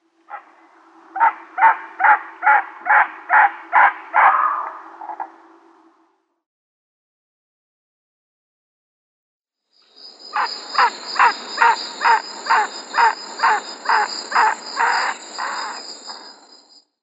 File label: Goliath Heron flight call